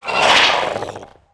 attack

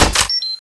mine set; tfa cso; heaven scorcher

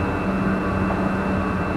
elevator move; elevators; bms objects

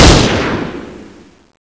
fire; cso; x-15

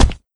step; doom3; commando

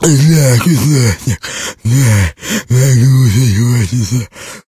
zombie idle

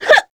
succubus shout; queen girl

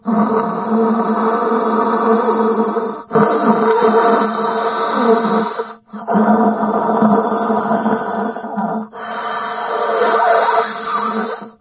rebreather, hazmat zombie; doom3

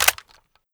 mag out, weapons; mas scar; cw20 extras

